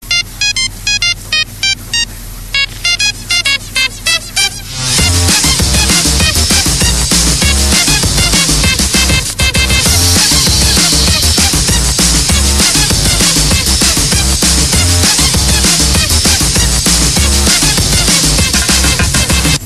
cucaracha cancion

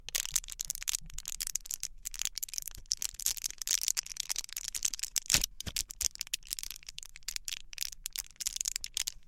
fogata

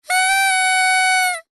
juguetes juguete

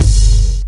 bajo

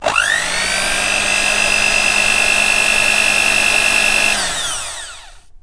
aparato

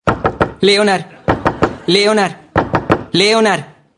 toc toc

